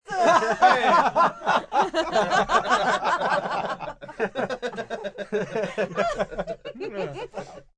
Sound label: Group Laugh Happy, Laughing, group of 5-10 (30-something) friends, light laughter (human voice); VoiceBox